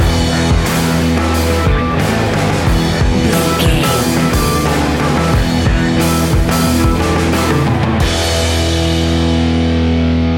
solid garage rock surge, A rocking music track with rock guitars, heavy rock drums and thumping rock bass lines. Heavy, loud, aggressive